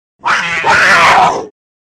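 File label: puma golos, puma voice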